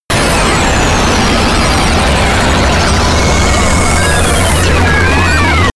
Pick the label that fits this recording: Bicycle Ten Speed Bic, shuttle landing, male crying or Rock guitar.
shuttle landing